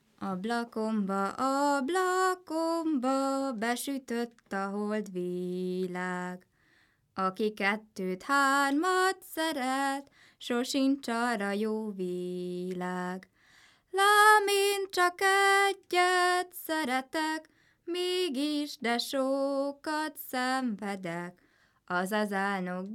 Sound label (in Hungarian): nepdal; ablakomba besütött a holdvilág